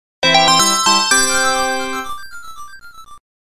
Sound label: music chaos emerald; sonic 3 knuckles; Chaos Emerald Collect